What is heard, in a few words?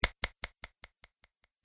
Finger Snap With Fast Echo; Body Sound Effects; Royalty Free Human Body Sound Effect - Human Sound Effect Production Element; Andrew Fleming